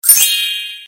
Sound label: Sms magic